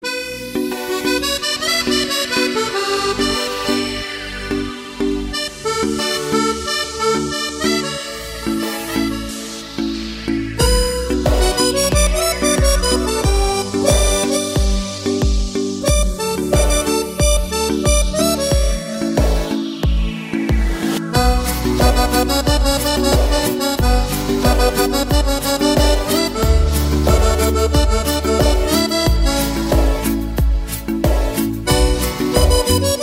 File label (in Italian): fisarmonica moderna